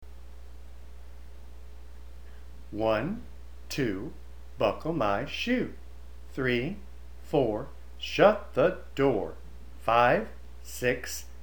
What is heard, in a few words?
Big fat hen